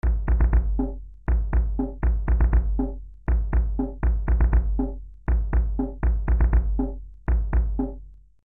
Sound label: drum